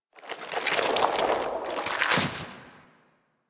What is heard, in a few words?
Idle Noble; Warframe; Broken Frame